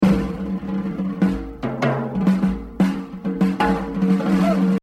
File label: Exercise, jazz drumming; Rufus Speedy Jo; The Evolution of Jazz Drumming (Gottlieb)